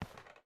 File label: Step Wood; miside demo windows gamerip 2023